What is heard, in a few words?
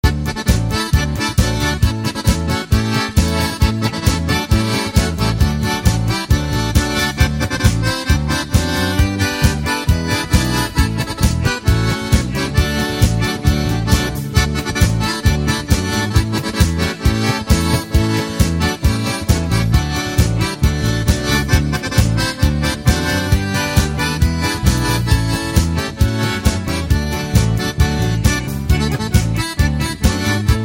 POLKA